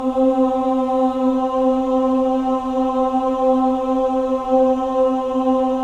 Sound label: men ah, Choir of Ahs; Roland - LA Composer vol. 1